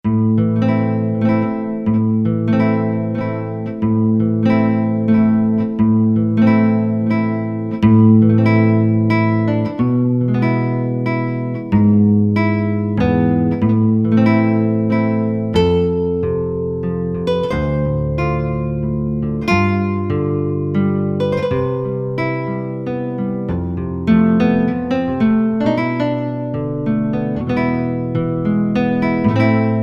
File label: Super Nylon